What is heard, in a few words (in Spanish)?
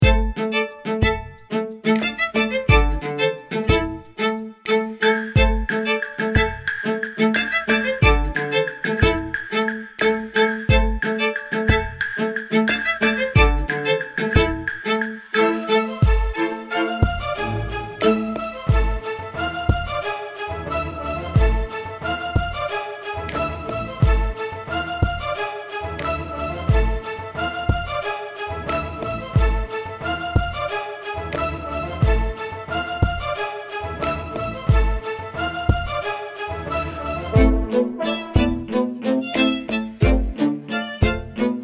MUSICA PARA TUS ESCENAS TEATRALES